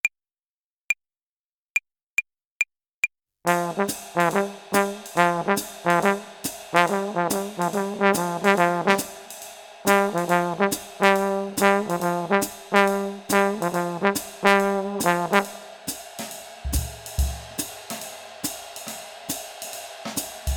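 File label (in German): Swing Phrasierung Übung; play along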